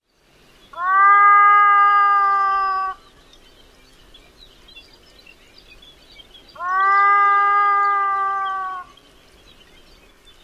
female mournful cries; AK

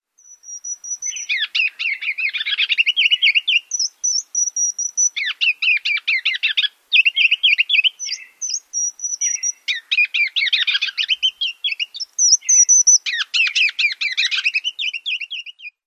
continuous song

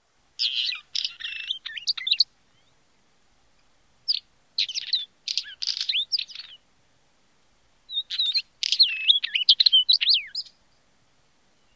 song; AK

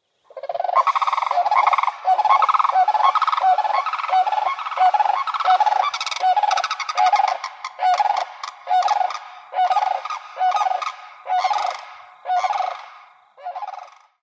unison calls of pair

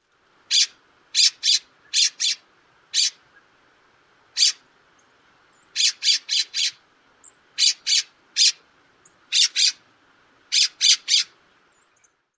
calls

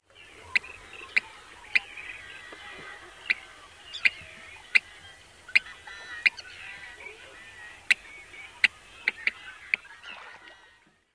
quipt call

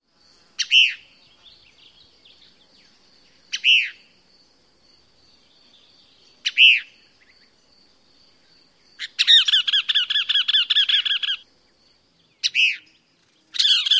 calls; AZ

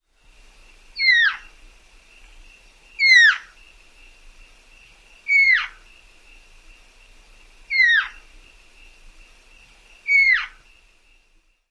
mew call

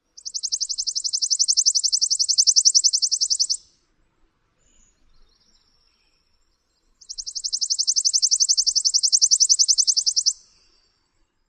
songs

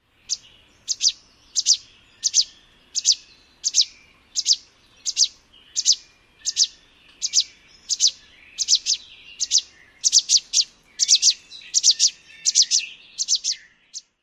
fledgling calls

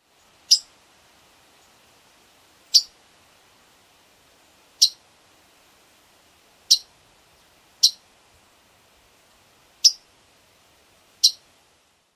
calls; NY

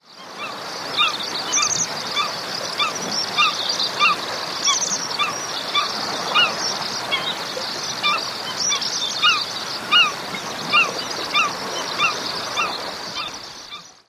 fledgling call

